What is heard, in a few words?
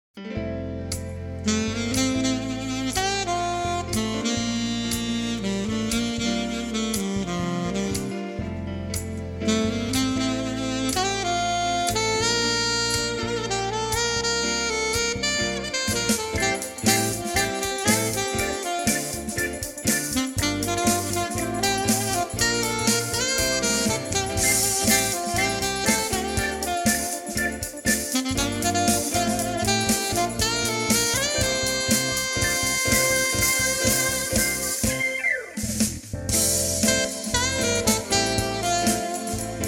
Alto Sax